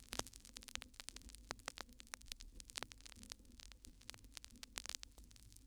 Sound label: Vinyl Crackles